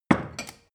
drinking glass put down rattle; gastronomie; berufe

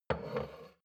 shot glass place table slide soft; berufe; gastronomie; soundpool

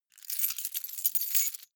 key ring large rattle hard; objekte; schluessel

